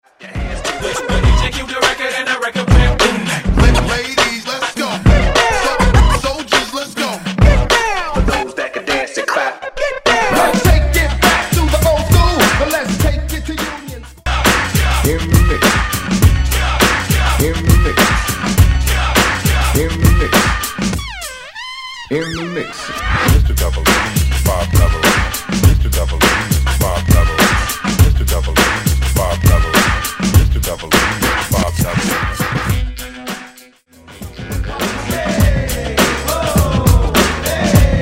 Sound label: Old School Party Break